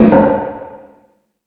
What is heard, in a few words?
Industry, Drum Machines E-MU Pro-Cussion